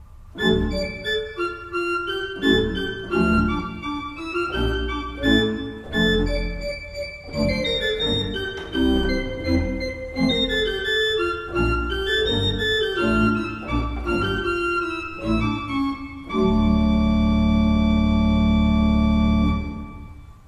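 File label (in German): Rohlfing Kreienbrink 8 II P, pfeifenorgeln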